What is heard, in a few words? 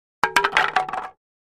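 2x4 Several Planks Dropped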